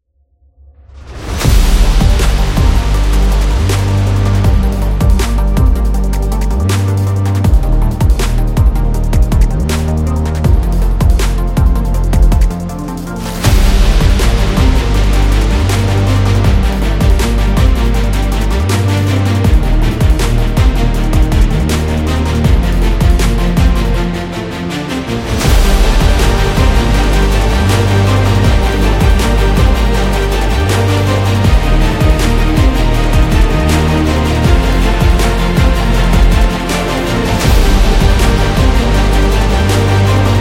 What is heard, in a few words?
Cinematic Hip Hop